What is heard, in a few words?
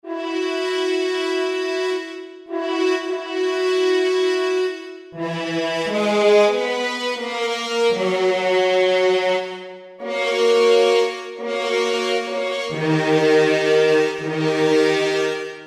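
Mute Horn Section, Roland SR-JV80-02 Orchestral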